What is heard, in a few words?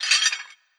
Metal; Legend of Keepers